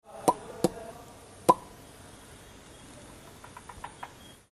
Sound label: frog call